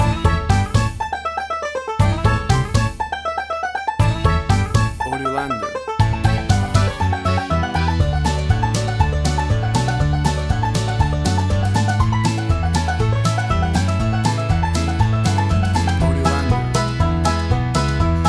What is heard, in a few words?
Bouncy Fun Country Music